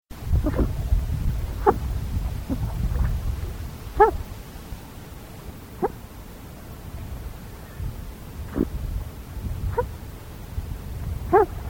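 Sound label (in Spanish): gallineta comun, aves; cantos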